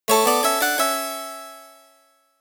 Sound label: Jingle, Fanfare; Animal Crossing Calculator - Fanfare; Nintendo DSi